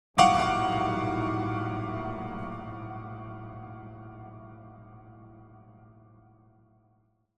Piano High Tension Chord; Hollywood Edge; sound designer's toolkit v2

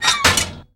Door Metal Crypt Open; Doors

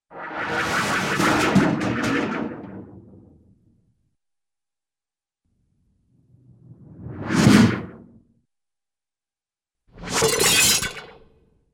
Warbley Metallic Swishes, Cartoon; Hollywood Edge; Cartoon Trax

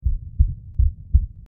heart

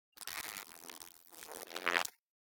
Insect Buzz - Alight On; Hollywood Edge; sound designer's toolkit v2

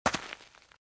Footsteps - Gravel - Walk - Left; RadwinIsland

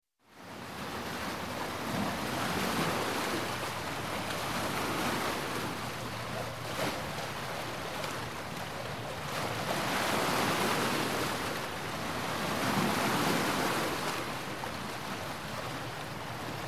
Water Ocean Light to Medium; Sound Ideas 2000 Series

